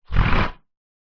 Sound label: hippopotamus grunt; animals